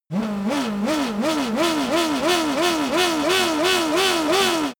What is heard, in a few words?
racing car f1 engine rev sequence